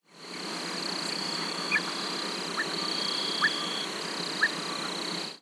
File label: inland dotterel